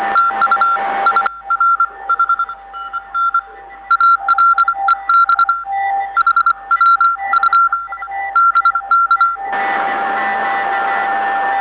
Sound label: 40M band CW